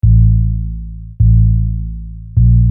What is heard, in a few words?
Sine Bass; Construction Kit; HIP-HOP; Sonic Foundry (Sony Creative Software)